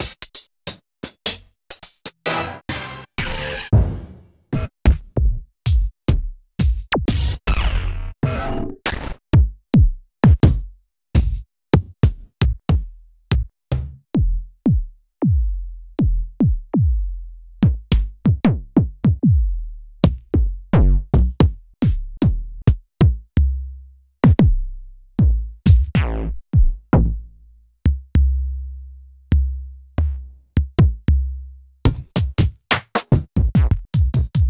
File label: Roland MC-909